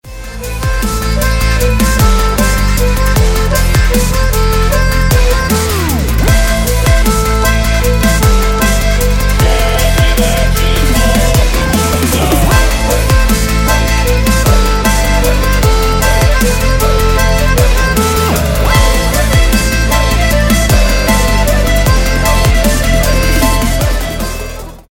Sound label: instrumental